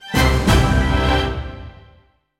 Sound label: Stage or Game Mode Unlocked; Beat Saber Clear Fanfare